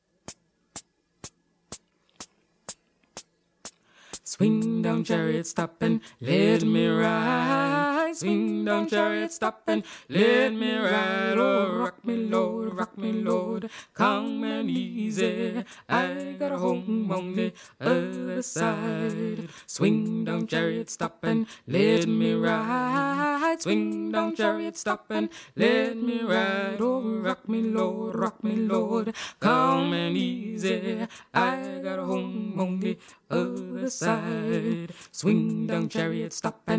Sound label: tenor